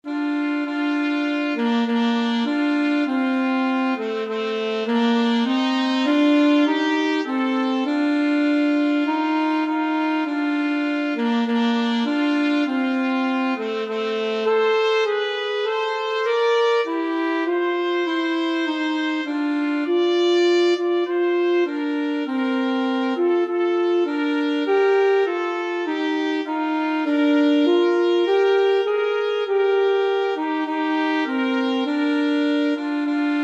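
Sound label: trumpet alto sax duet; america the beautiful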